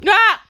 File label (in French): cris fille